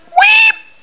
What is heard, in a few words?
wheep, degu